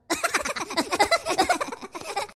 minions laugh; Despicable Me

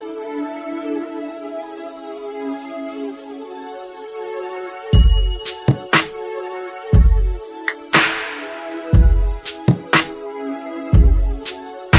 excellent bass tone

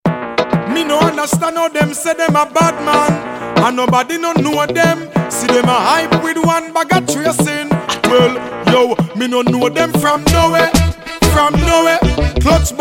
busy signal

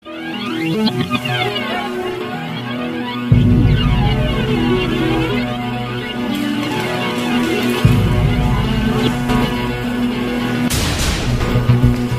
flute

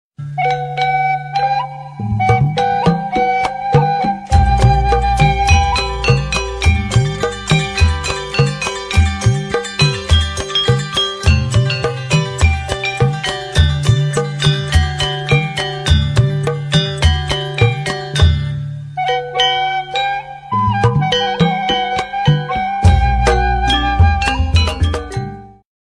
gamelan jawa

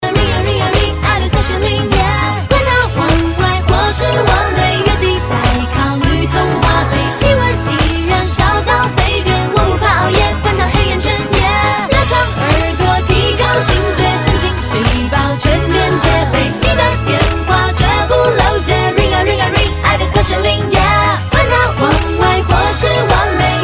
ring ring ring

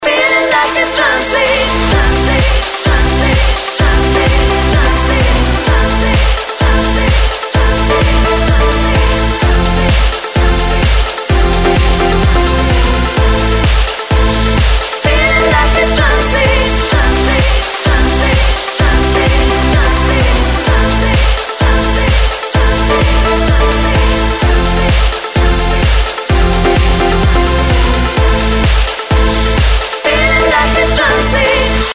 trance lead, ringtone

